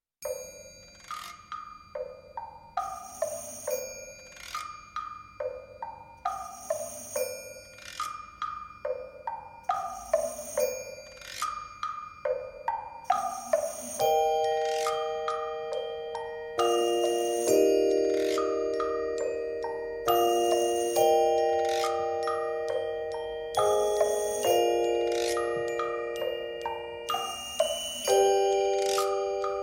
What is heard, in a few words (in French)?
Percussion; La Batterie-Fanfare fait partit des trois orchestres de la ville de Marnaz